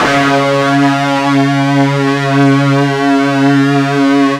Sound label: FUZZ C#2, ULTRA FUZZ, Guitar; East West; Steve Stevens Guitar Samples Collection